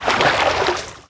Swim

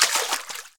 Water Run

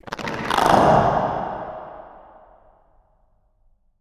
Timid; moul; Bahro

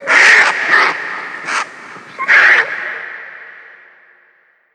Desert Vulture

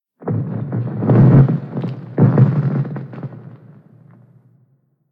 Random Ice

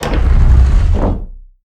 Link Door Open Close

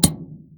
button; moul; Tiam

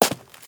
Stone Turn

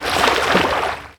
Swim

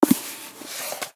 Book Open

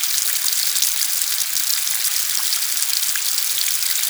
Fountain Loop

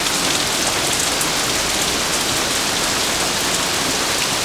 Rain Loop; moul

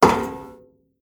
Metal Walk

